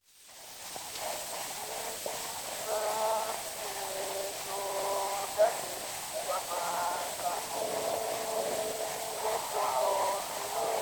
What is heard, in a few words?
Zulu Recordings; Father Franz Mayr; Ihubo lempi